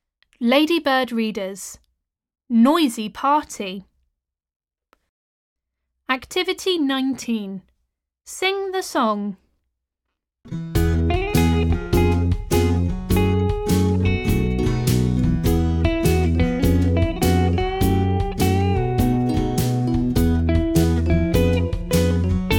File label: Noisy Party Song